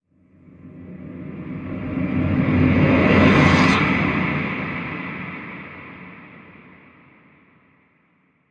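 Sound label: reverse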